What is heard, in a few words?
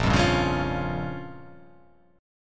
A sharp Minor 6th A sharp down, chord